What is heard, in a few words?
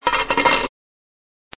coin